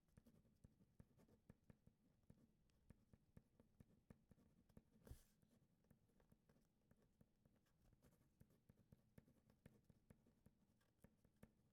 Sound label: Writing Pen